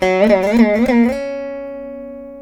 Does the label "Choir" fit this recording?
No